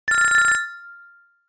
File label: Classic Phone Remix; BlackBerry OS-5